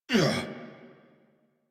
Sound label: Pain; New War; Kahl